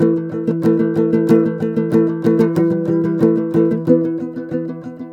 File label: TOPSHUR; Soundscan vol.60; Asian Traditions; AKAI